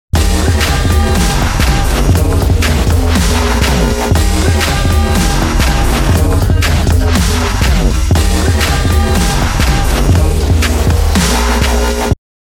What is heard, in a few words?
beat